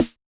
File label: Yamaha DJX 2B, SD T9 H